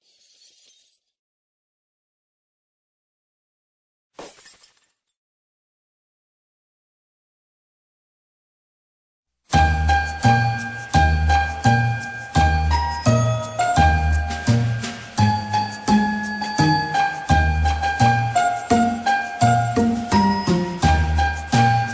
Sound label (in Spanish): NAVIDAD NAVIDAD KARAOKE